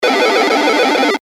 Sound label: roar; trogdor